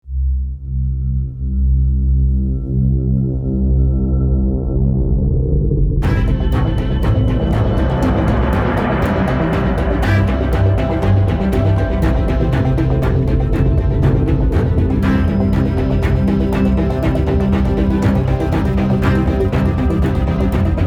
Action (Loop)

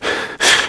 Fatigue Medium

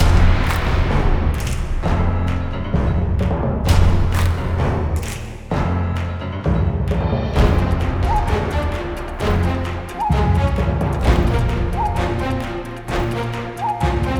Flank Drums (Battle Full); tak and the guardians of gross; wii; Trashthulu

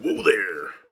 punkcroc block

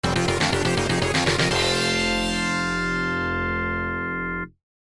MISSION CLEAR (Internal sound version)

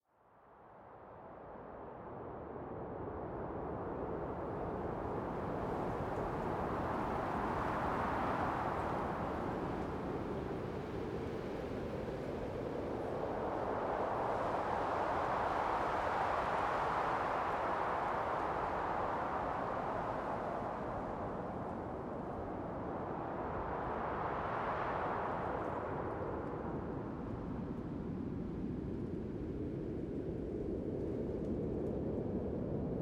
World Ambience

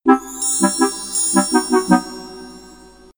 (Jingle) Switch Activated; rayman 2 remastered soundtrack

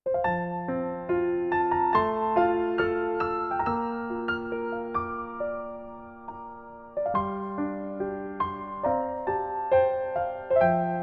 Piano Major Chord B; planetarian original soundtrack 2016

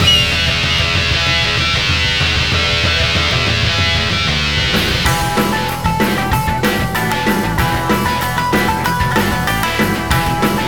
BGM Character Select; Naruto: Clash of Ninja Revolution 2; Wii

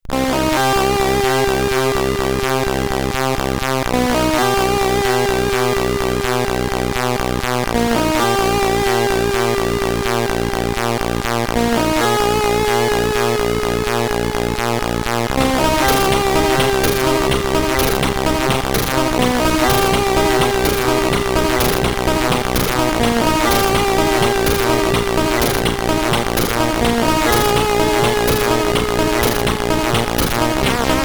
Ending, SID Stereo, Commodore 64; Platoon

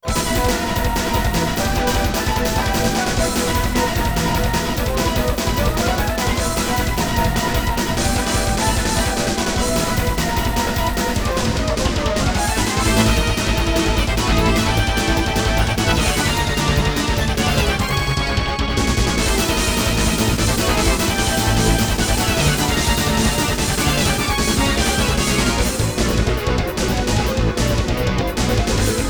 BGM; Grail Hunter; PC-98

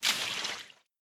deepwater footstep; adams venture origins

